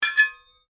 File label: SCRAPE METAL ON METAL HEAVY RING; Foley sounds for melee weapons; Scrapes; Objects